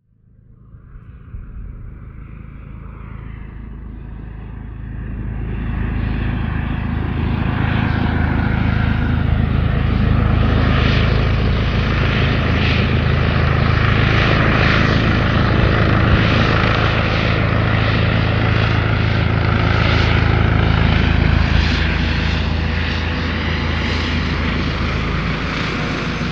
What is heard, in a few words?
Police Helicopter